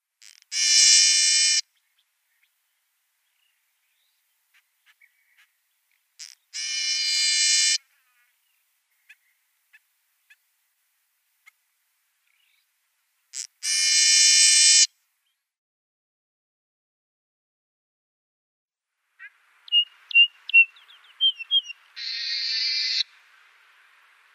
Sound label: Trumpeter Finch song